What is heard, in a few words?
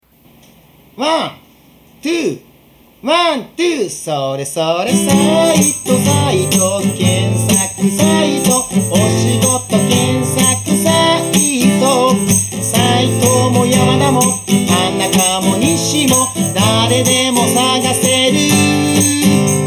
pop